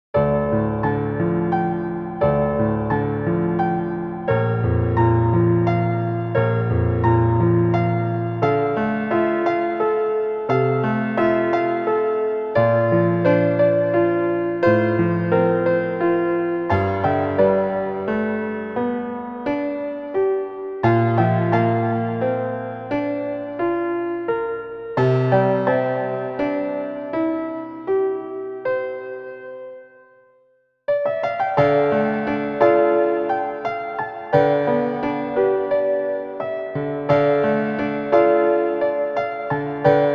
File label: Theme of Bloodstained (Piano Version); Bloodstained: Ritual of the Night